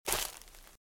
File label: Footsteps Leaves Walk Left; RadwinIsland